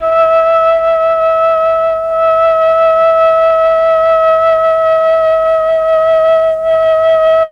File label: flute; ILIO; Propeller Island Legendary M400